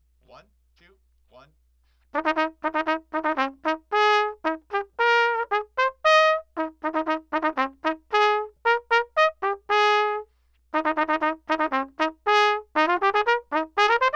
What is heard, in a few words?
Polka Militaire - Trumpet Solo Only